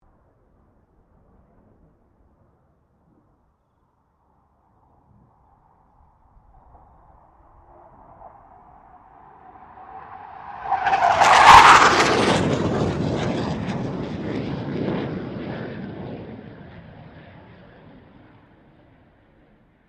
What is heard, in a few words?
BAE Hawk 127 pass; Airplanes (Sound Effects:Vehicles)